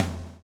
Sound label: swing